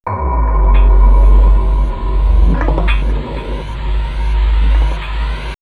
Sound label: Swirls Alien Attack, pads; X-Static Goldmine